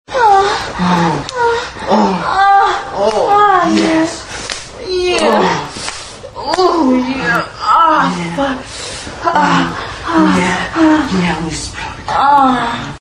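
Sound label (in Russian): zvuki seksa, sex sounds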